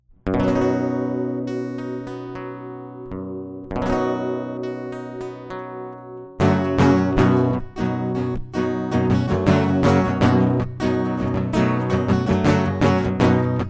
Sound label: acoustic